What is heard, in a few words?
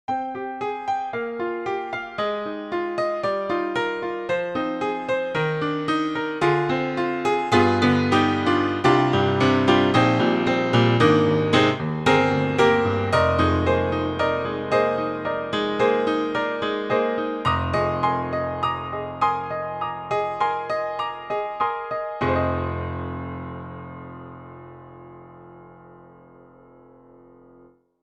Pop Acoustic Grand; IK Multimedia; SampleTank2.5XL